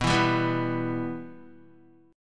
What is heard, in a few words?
B Suspended 4th Sharp 5th, Guitar; strummed; 4F,4A,5C,5G,5C,6E; Bsus4#5; 6,7,7,4,4,x; JGuitar